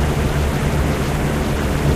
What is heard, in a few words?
booster; techage modpack; Joachim Stolberg